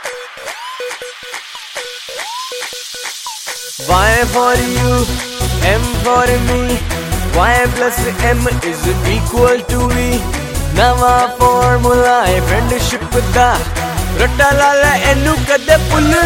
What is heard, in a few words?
Mobile Ringtone